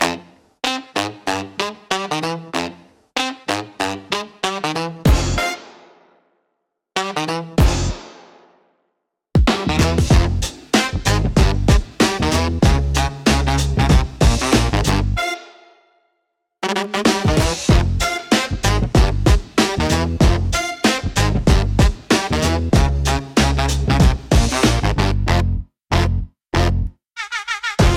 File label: Comic Book Bounce